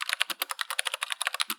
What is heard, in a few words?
keyboard typing